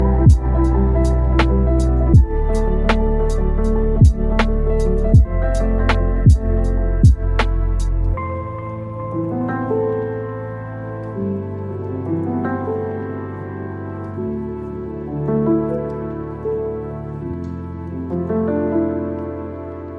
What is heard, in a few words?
hip-hop beat; Music